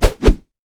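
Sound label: armadillo basic attack; rammus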